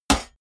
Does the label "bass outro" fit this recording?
No